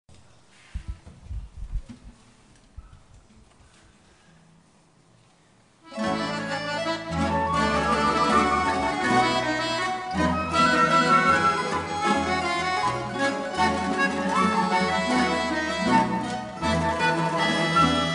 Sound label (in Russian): Оркестр; Мерефа